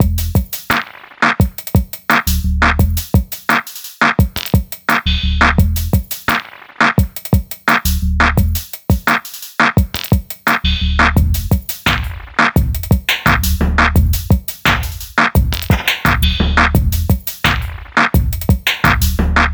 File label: waveshaper, dub; Modulok